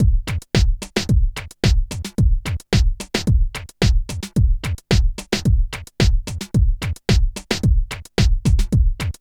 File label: HOUSE; INIS; Funky Xtreams